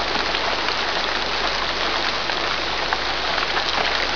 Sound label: Rain; Gunlok